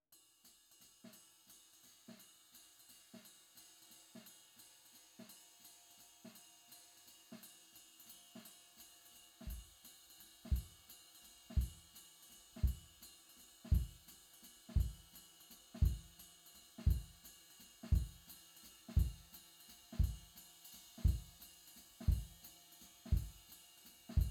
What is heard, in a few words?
Kick IN